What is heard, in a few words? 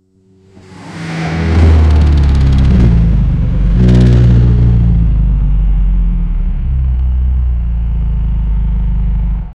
ev muscle car startup